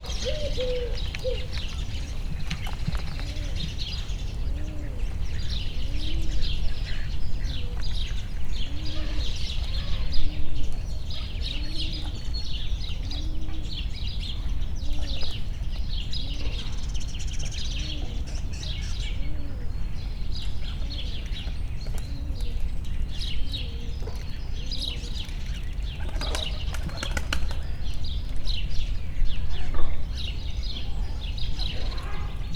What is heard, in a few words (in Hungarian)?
állatkerti hangulat, házi veréb; Hortobágyi Nemzeti Park 2013